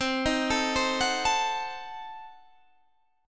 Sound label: C Diminished 7th C down Guitar Standard; arpeggiated; JGuitar